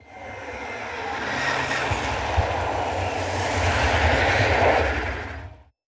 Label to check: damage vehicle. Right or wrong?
Right